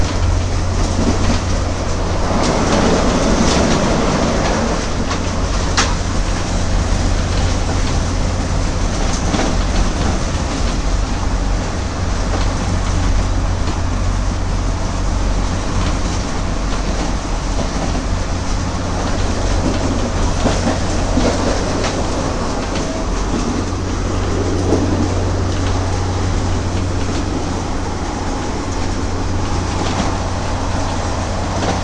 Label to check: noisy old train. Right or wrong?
Right